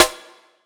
Snare Tight Rim, drums

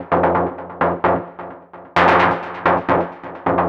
F 130 bpm, Synth; Loops; Tonal; Ableton Live 12 Lite; Core Library

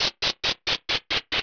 dungeon stairwell top descending; Open Zelda; Quest Designer 2.0.4b

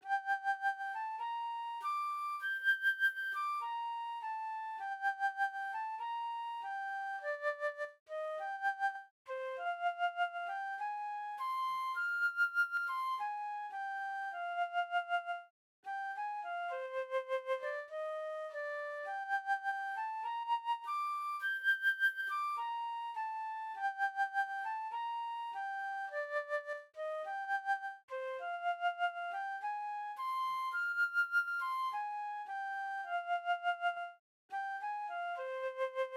Flute; Sony; ACID Pro 7.0

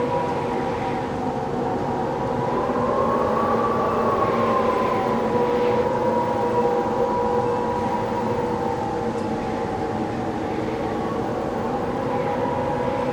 Mountain Window Wind Tunnel Sm Loop Stereo; Journey

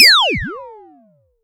FX Ring Grav Brake; FX Hit; Drums; Ableton Live 12 Lite; Core Library

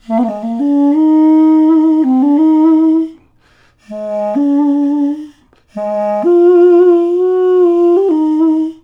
duduk; Ableton Live 12 Lite; Max

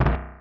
Bomb Inside; Weapons; Open Zelda; Quest Designer 2.0.4b

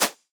Shaker; Drums; Ableton Live 12 Lite Core Library

